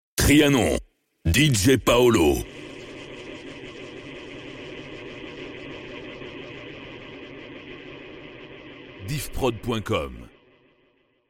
Drop Dj; Trianon

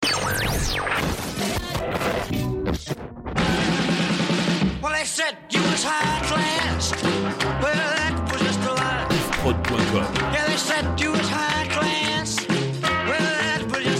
Medley Rock N Roll 88 BPM

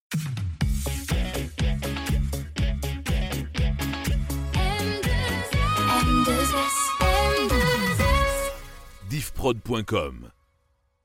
Jingle Chante; M2S

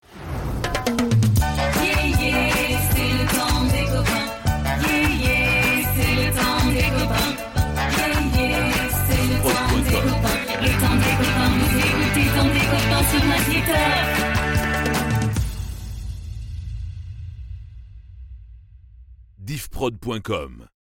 Jingle Chante; Maxitop; Le temps des copains

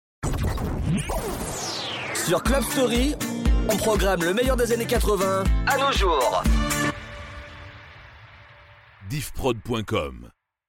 Jingle Meilleur annees 80; Club Story

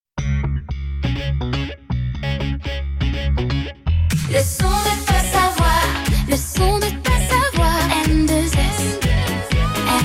Jingle Chante; M2S

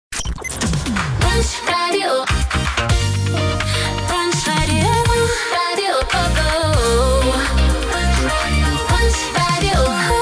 Radio Full Jingle Chante; Punch

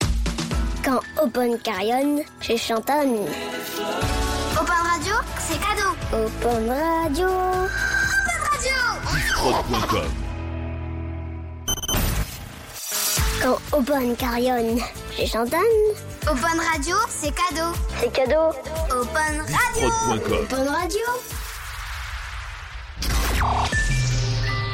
Radio Jingles Enfants; Opon

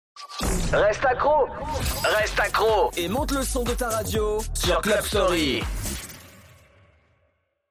Jingle Reste accro; Club Story

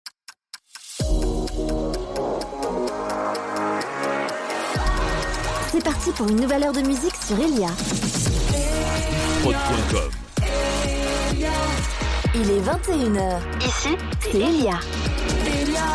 Top H Chante 21h; Helia

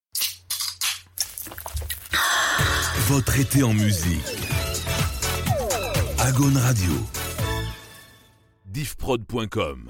Radio Sweep ID Ete; Agaune

